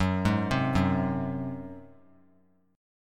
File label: Gb Suspended 2nd Flat 5th 3C,3F,3A,3D; Gbsus2b5 arpeggiated; 18,15,15,16